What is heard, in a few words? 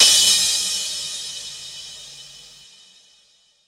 big drum crash sample f key, Royality free crash cymbal tuned to the F note.